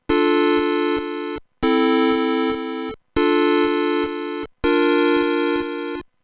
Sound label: test poly